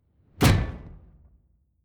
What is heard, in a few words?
sci-fi ricochet metal; schusswaffen